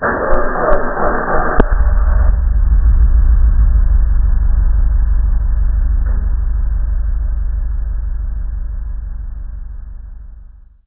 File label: car start success